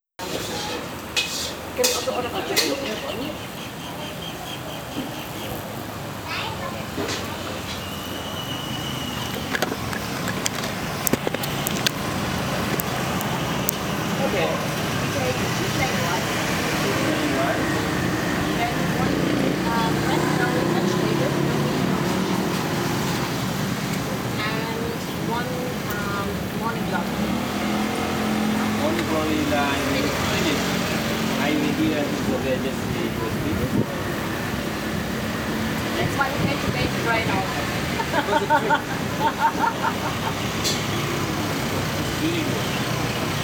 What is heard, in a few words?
Mae Haad, Koh Tao